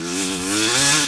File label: Low, Engine